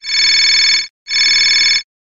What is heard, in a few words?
iphone alarm; volume